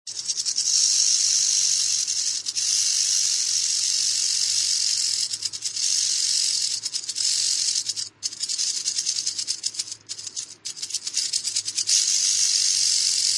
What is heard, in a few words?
Great Basin Rattlesnake; Jeff Rice; Univ Utah